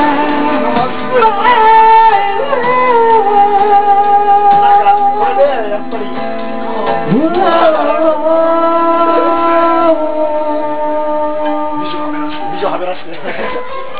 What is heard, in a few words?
Karaoke